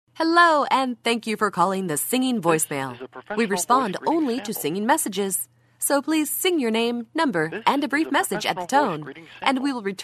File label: Singing Voicemail